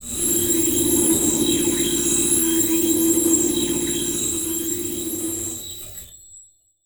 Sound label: Transition Feedback Warble; Adobe; Production Element